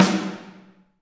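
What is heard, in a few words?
snare drum; Best Service Gigapack 2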